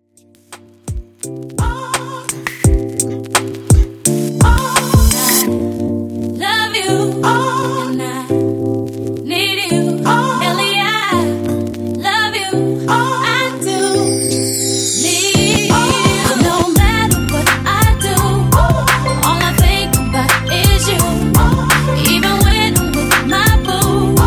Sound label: Re Drum 85Bpm